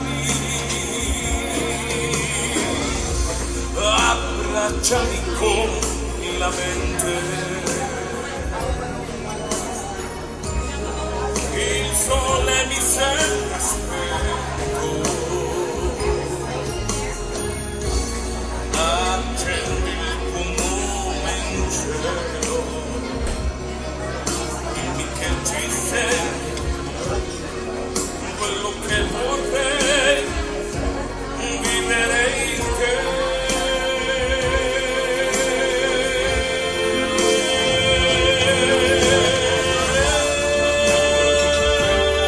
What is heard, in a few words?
pouring his heart out italian style at cosmos restaurant